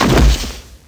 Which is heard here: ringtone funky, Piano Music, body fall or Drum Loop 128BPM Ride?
body fall